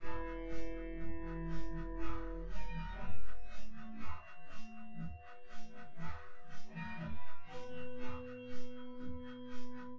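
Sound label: with drums synth conv 5cm sim; SASSEC07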